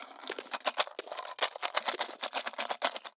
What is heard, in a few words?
tin coins foley metal